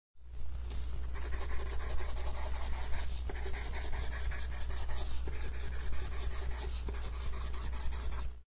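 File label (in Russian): карандаш медленно кистью написание звука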